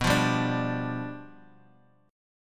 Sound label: G 7th B